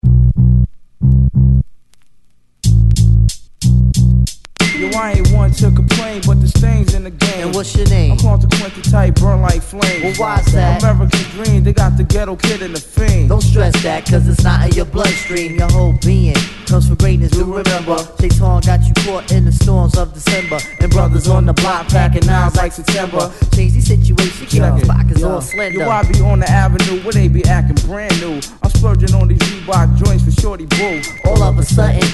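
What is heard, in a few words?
hip hop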